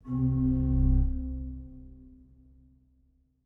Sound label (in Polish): organy C1